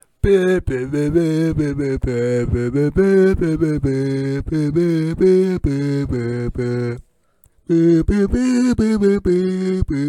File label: music